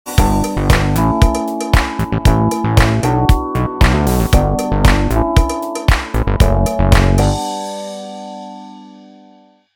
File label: Holyday Bass Drums; PRO12; opxpro2